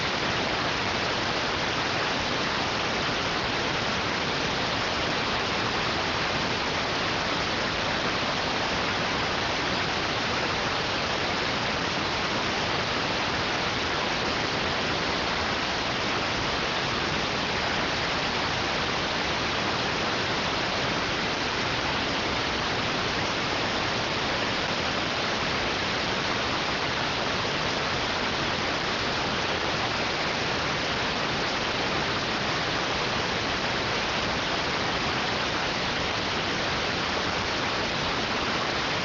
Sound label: rtty rx